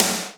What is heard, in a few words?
COMBO SN; Big Fish Audio; Synth City